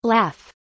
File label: laugh